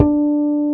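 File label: harmonic D4, fretless bass; Northstar - New Gold VOL-2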